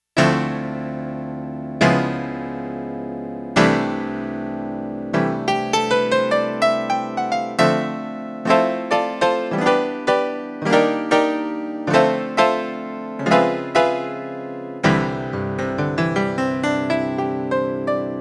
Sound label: PIANO, Roland JX-1; Factory presets